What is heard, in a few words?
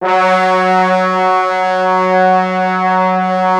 F#2, Orchestral Brass; Best Service ProSamples vol.20 - Orchestral Brass; AKAI